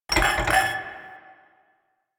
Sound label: Glasses Clink; Warframe; Quests; TheNewWar; Ambience; Zariman